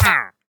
wandering trader hurt; Minecraft

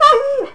dog

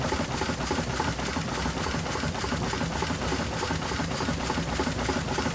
Gyrocopter loop

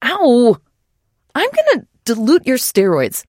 pain respond; 商人杰恩（七日杀）

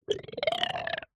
strider idle

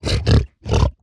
hoglin idle; Minecraft; 疣猪兽（我的世界）

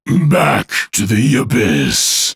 death

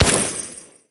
fire

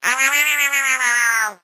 8bit hurt

